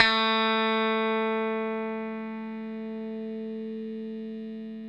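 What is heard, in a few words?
electric guitar sus A4 right; Sampleheads; Whole Lotta Country 1 & 2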